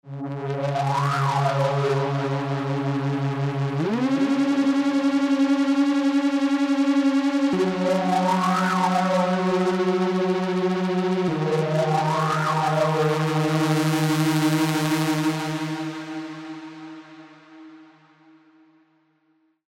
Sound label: pads; Purgatory; Revealed Spire Aporia Pads